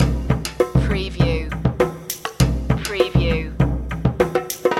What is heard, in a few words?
indianshop loop